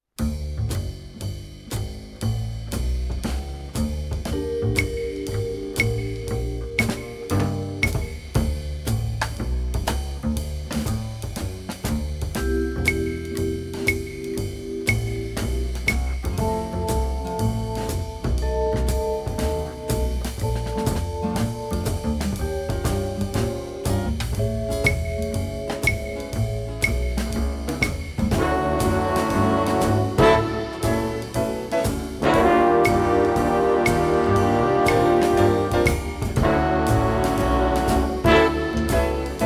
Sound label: Velvet Brass; KPM 1000 series; Flamboyant Themes Vol.2